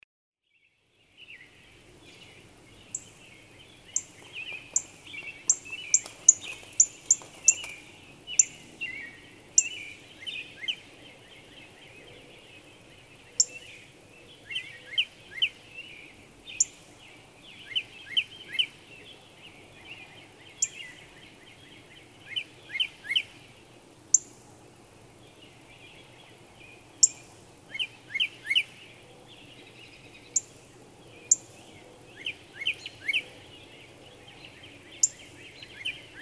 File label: Northern Cardinal